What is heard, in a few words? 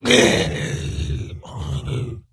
Wound Crit; General Vezax